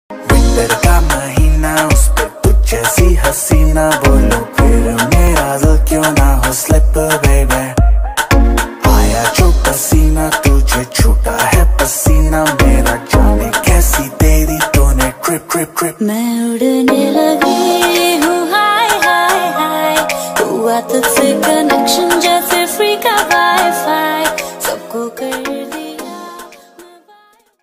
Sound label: rap ringtone